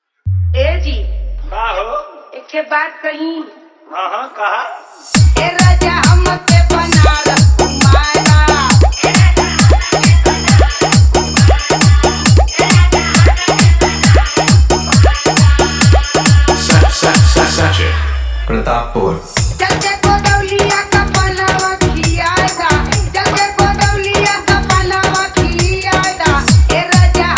Bhojpuri Dj Song

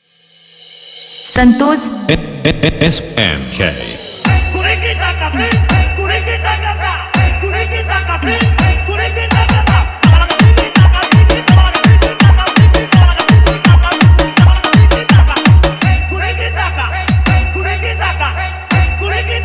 Dance Bass Band Mix

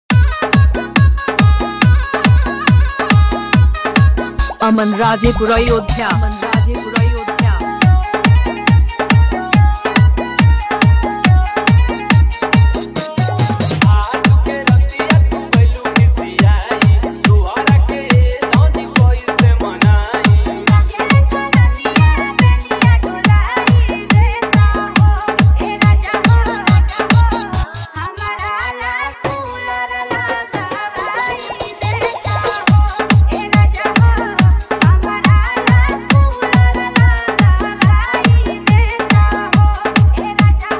Bhojpuri Dj Song

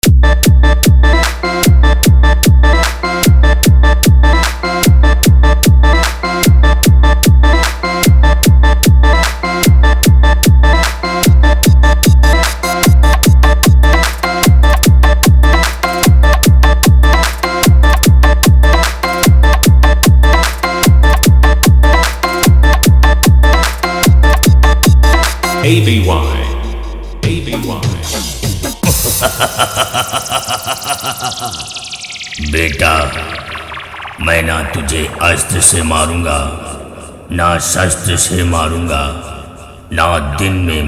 Competition Dj Song

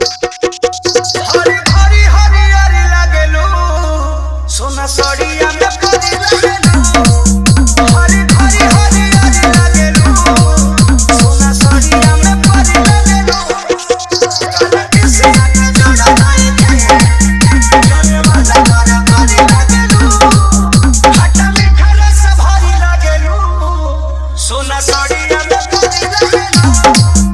Bhojpuri Remix Song

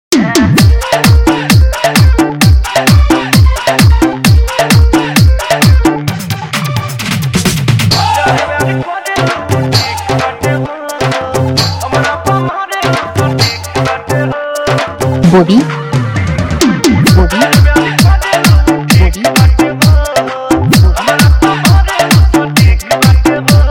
Bhojpuri Song

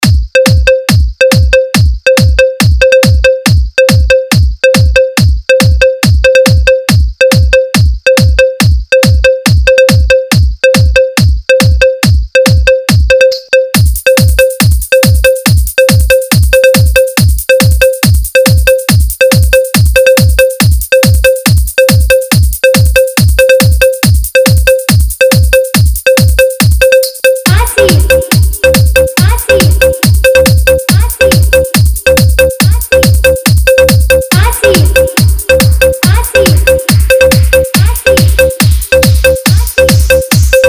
Train Horn Competition Beat Remix

